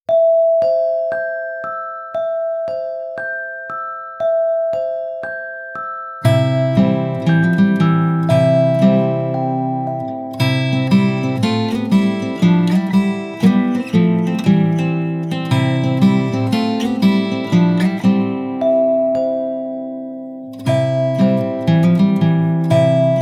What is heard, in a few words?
Instrumental